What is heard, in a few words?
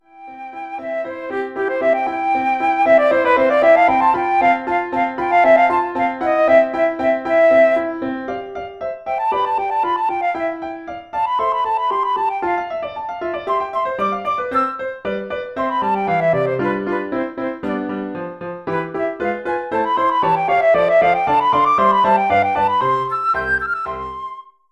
CLEMENTI SONATINA Op 36 No 3 1st mvt + flute line; Gordon Taylers flute line